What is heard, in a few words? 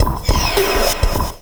Sweep Bumpers; Sonic Foundry (Sony Creative Software); Esoterik Beatz; Sweep Fx